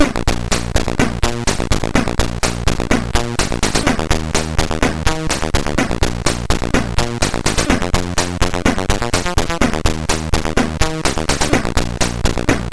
T001 sid MOS6581R4

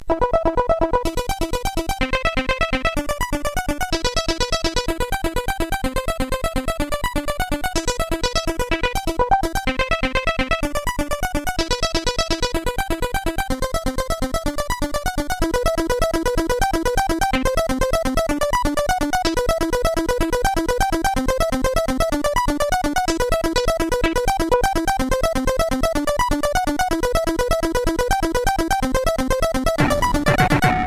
Raindrops, CSG8580R5

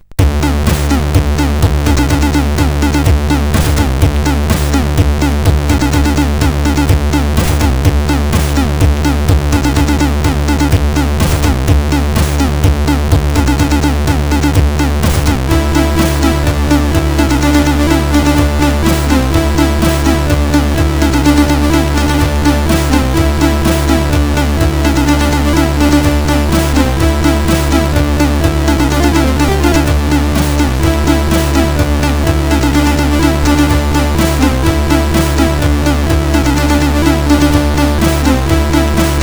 Loader tune sid CSG8580R5

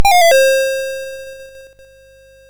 Buggy Boy, MOS6581R2

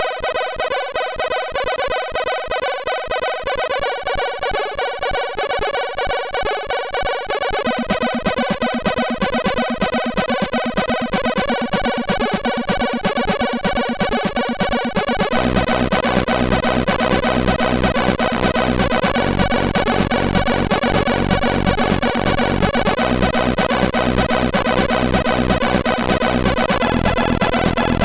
Hot Pot,sid CSG8580R5

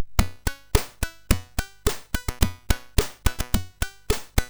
Test Modal Transpose, MOS6581R4

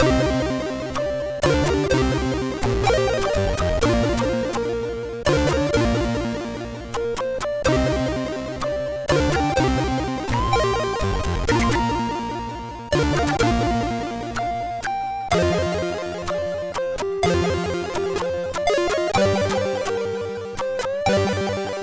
Pan Fluit, sid MOS6581R3

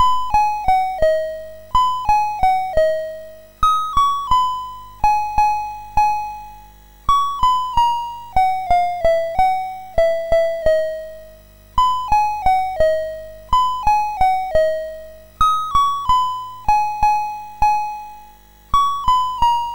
MOS6581R4; sid

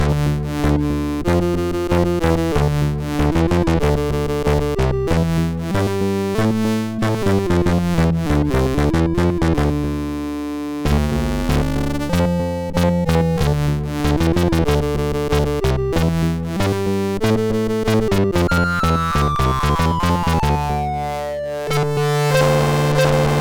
Karate Loop, MOS6581R3

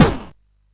SFX; Narco Police

